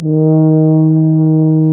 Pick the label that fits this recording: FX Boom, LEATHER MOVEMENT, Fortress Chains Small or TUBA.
TUBA